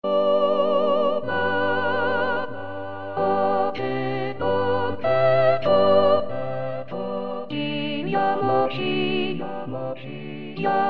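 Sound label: soprano; Eco de lassus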